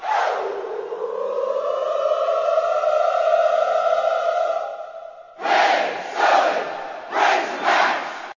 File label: woo pig sooie